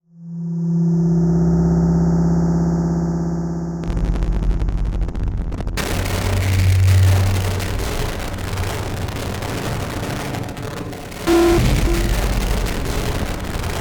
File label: Distortion Random Static; Module Production Element Title Imaging; Imaging Elements